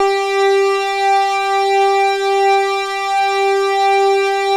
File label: P5 STR G4, PROPHET 5; Greytsounds VOL-1